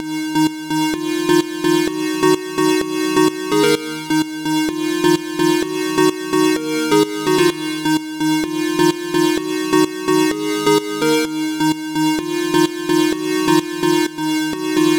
synth chords, Key A Bpm 128; Loop Nerds; Big Room House Allstarz

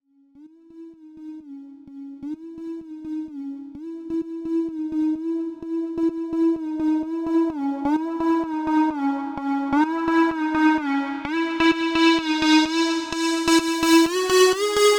synth melody filter, Key A Bpm 128; Big Room House; Loop Nerds; Big Room House Allstarz

